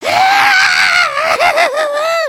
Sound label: panic, emotes